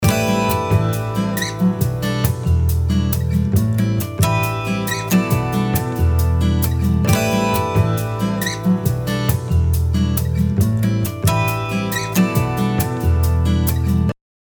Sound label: LOOP